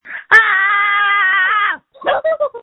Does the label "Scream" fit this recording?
Yes